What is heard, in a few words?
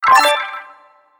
gain stop, progressive jackpot; wbg games